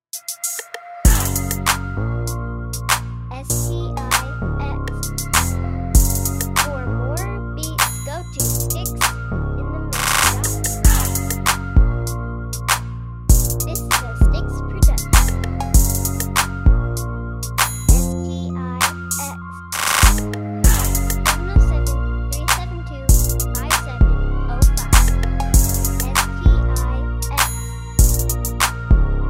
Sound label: Street Sweeper